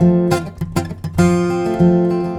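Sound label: F major, acoustic guitar 100 BPM; Best Service; World Colours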